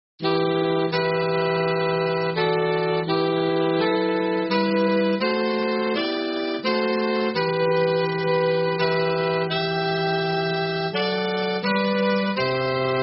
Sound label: Tenor